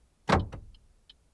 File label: Car Lock Open